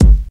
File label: classic studio steel kick drum sound G sharp key